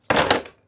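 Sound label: Tool Box Clutter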